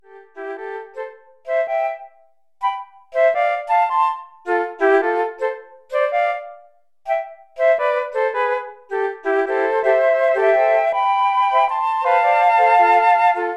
unaccompanied flute quartet

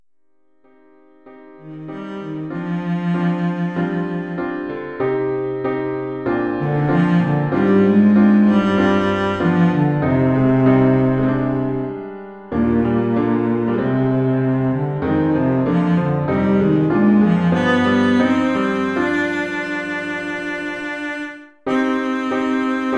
cello solo

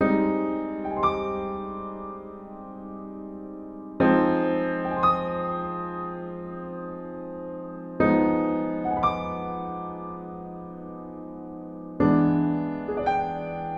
intermediate piano solo

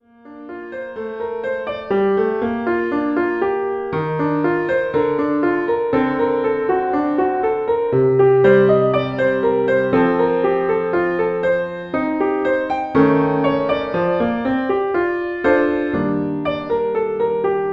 intermediate piano solo

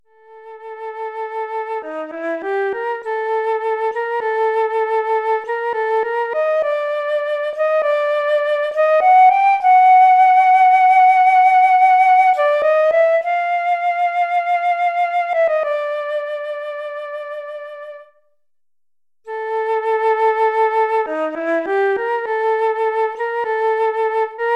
flute solo unaccompanied

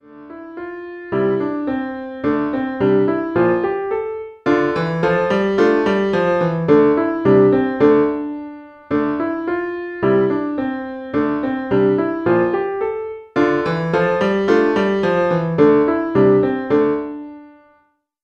elementary piano solo

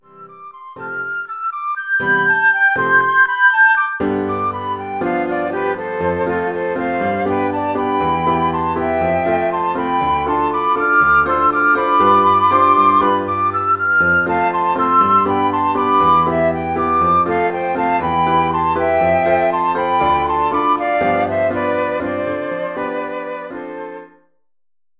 flute duet + PA

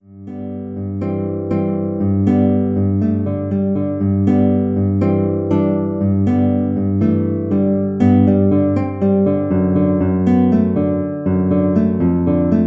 classical guitar

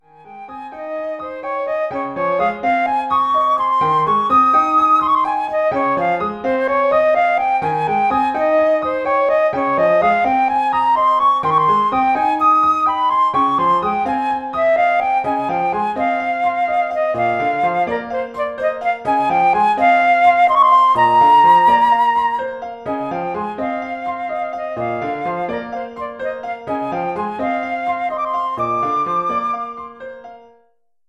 flute solo

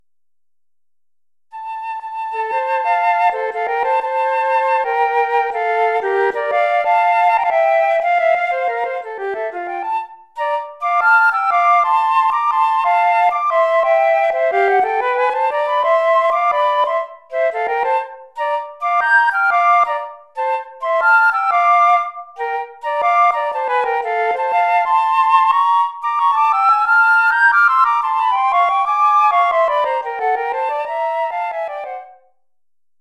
flute duet unaccompanied

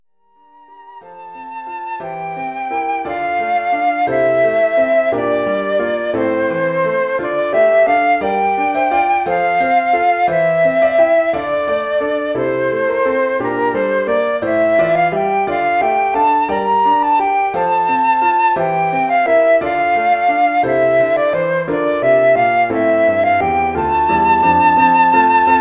flute solo + PA

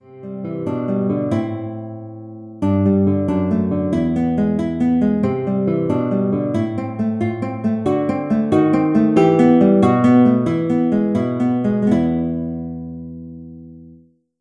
classical guitar

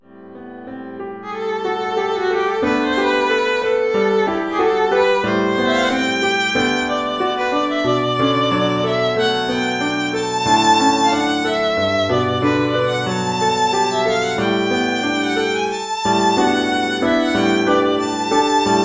violin solo + PA

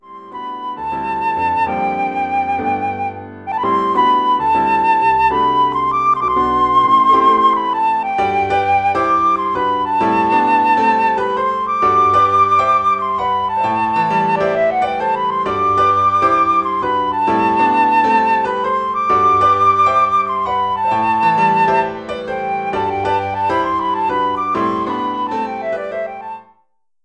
flute solo

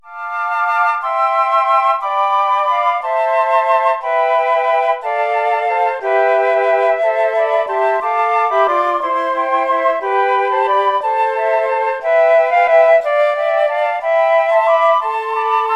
unaccompanied flute quartet

